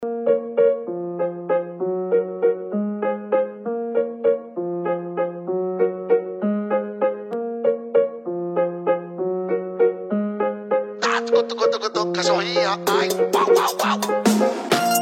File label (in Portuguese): esp insane sound